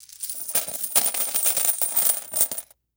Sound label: Coins Drop; SFX Producer